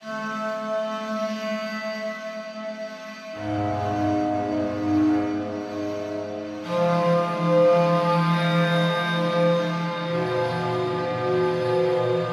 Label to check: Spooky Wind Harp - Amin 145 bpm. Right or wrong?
Right